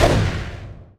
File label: armor shredder target; weapons; dota; snapfire